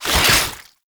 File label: Infested Spore Pistol Reload; Weapons